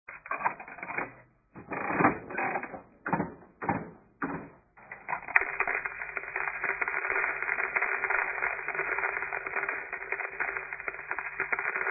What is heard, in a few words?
Poker Machine Jackpot